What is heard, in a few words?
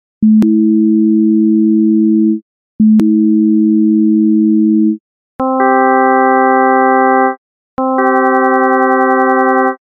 fiths